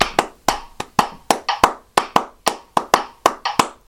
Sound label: clapped